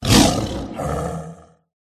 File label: tiger attack voice up